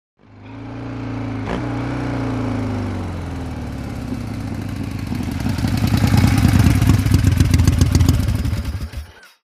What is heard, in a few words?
Stop Off Harley Davidson